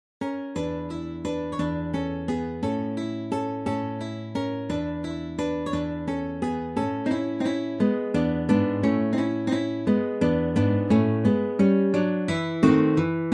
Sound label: romances for guitar